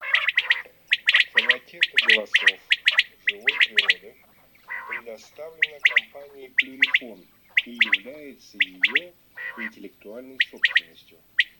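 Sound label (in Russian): Перепел Quaglia, chip mini для манка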